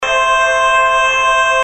手机铃声; 音MAD; 蓝蓝路

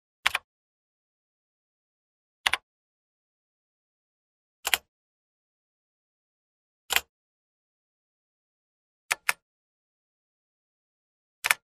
键盘

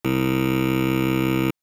嗯 Bass; 音MAD; 蓝蓝路

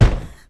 拍桌; 你的名字; mitsuha

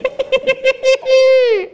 嘻嘻嘻 C5; 安德鲁加菲尔德

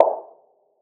杯子 Snare

